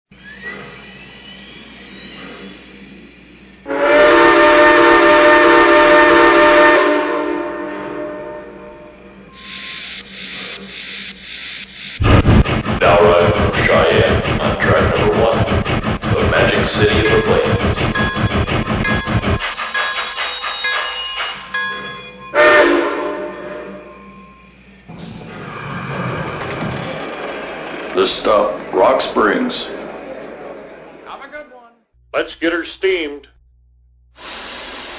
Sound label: 484 FEF; 844